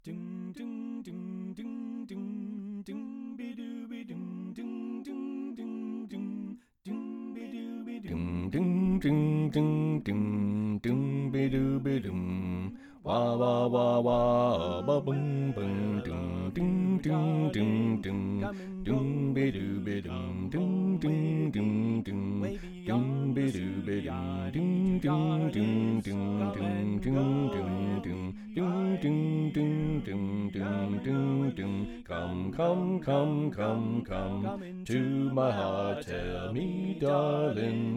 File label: Bass Left; Pennsylvania Groups